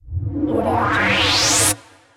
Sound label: incoming swoosh